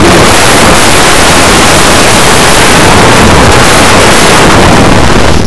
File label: gear